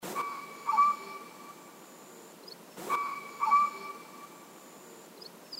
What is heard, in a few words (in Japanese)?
kiji